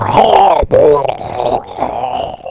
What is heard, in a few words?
death